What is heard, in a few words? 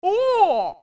Ooh; Pamela's Dad: "Ooh!"